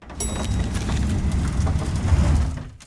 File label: Elevator Ding Open